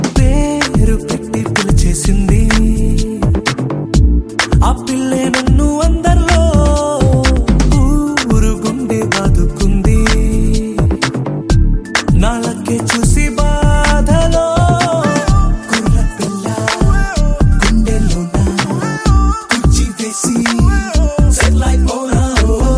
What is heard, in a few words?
Telugu Ringtone